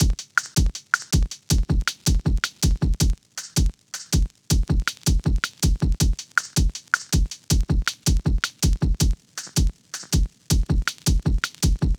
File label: Drums 80bpm Drum Loop Hip Hop